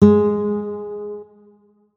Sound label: notes, guitar; Hideout Furniture